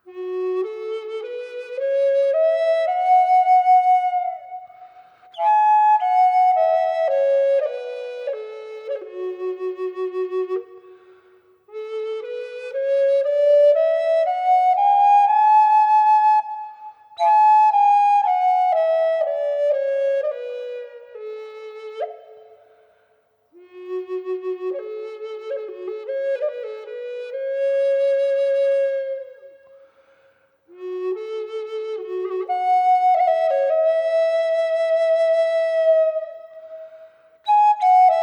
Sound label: granadillo f-sharp reverb, flutes